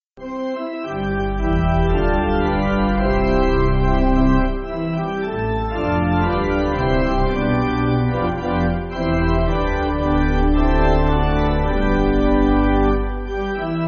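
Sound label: Pipe, Music Backing Files for Churches - Pipe Organ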